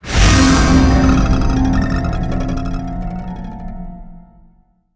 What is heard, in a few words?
Jester solo